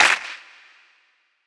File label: clap sound clip d sharp key; single hits one shots